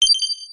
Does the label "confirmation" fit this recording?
Yes